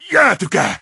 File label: ice wiz atk